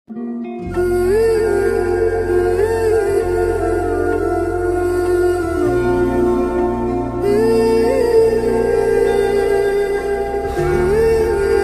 emotional bgm ringtone song ringtone sad bgm ringtones